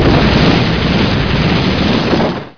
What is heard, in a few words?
teleport warp ball